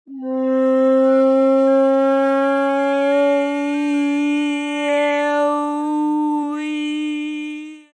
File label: flute voice